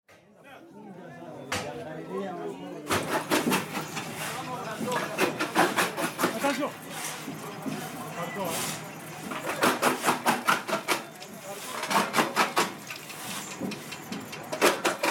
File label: ambiance; creuseurs du katanga